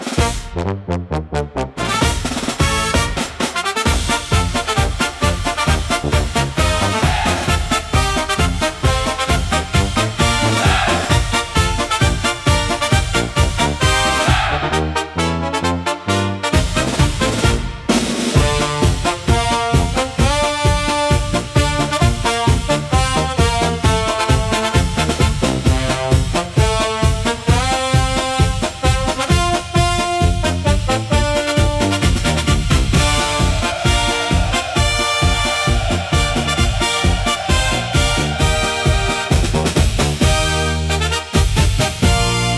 Parade of Tin Drums, upbeat carnival for festivals and parades